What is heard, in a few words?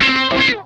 RASP, Funk Guitar; 90 s Sample CDs; Zero G